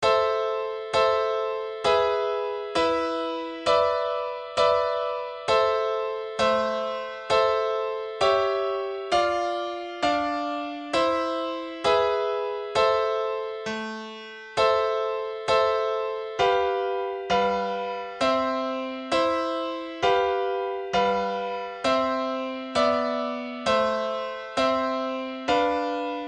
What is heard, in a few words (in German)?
2 akkorde a moll